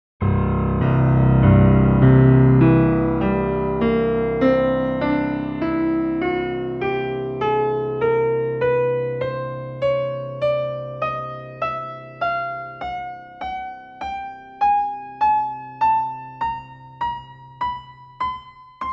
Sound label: Harmonic Series 1 32